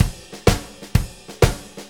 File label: Blues Loop, Drum Tools, Full Kit Loops